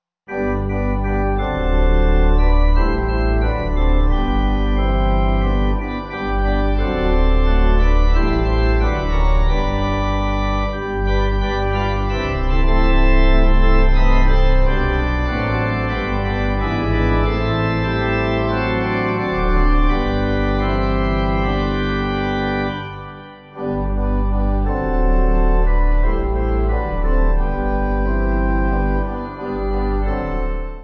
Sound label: Some Day Youll Hear, Pipe